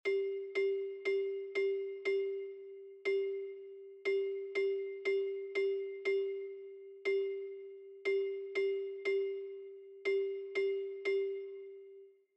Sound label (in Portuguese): SOL Sol Solado. Melodia